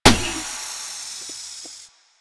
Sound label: Car Tire Pop